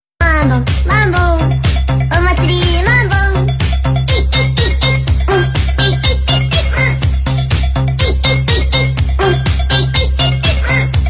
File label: funky mambo